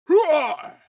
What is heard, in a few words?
male shout hit